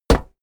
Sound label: step walk metal solid; schritte